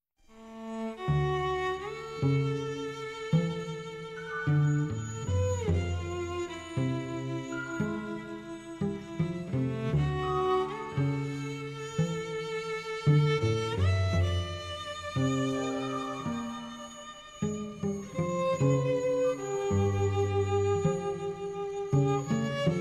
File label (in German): Traummusik